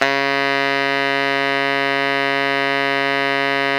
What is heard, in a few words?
TENOR FF C#3, Saxophones; Greytsounds; Philip Wolfe David Crozier's Saxophones